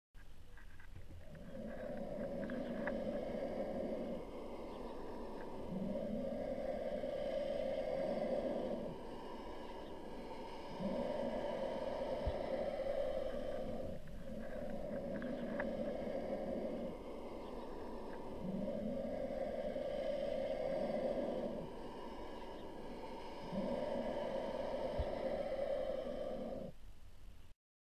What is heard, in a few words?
Turkey Vulture Nest Sounds